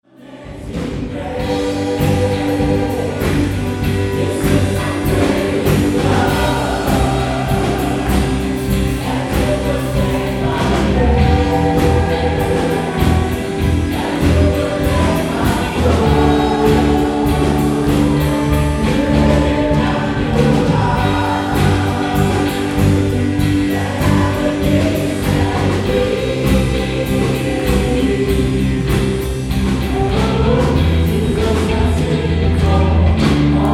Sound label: Mennonite Amazing Grace; MennoniteDiversity; Upland Peace Church